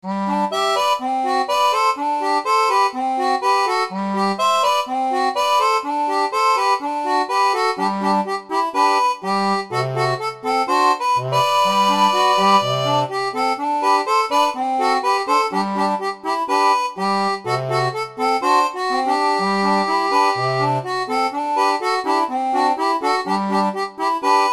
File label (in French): chanson, seraphone